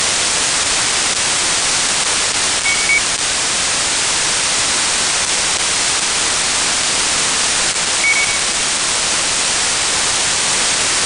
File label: Wirelss Cell Charger 174kHz